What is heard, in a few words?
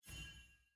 ping caution; lol